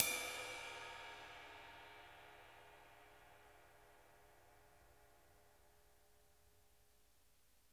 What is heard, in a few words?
ride; Sonic Reality; Interactive Drum Kits; 90s sample cds